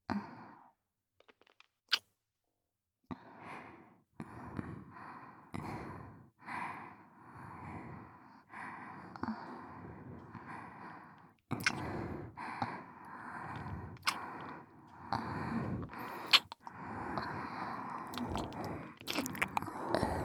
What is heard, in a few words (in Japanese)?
両耳舐め＋キス; ASMR